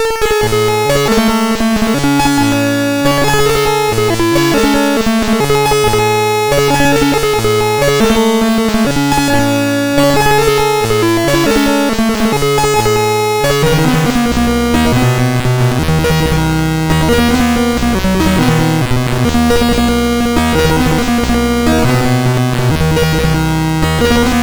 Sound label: Randomly generated music BASIC, sid MOS6581R2